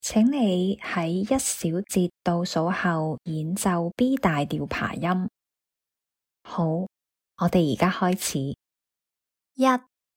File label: b maj arp